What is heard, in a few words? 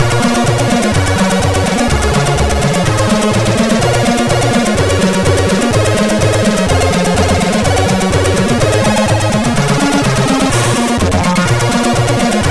No Scroller Mix end, MOS6581R4